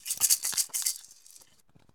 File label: Percussion Native Shaker; SFX Kit; Foley - Percussion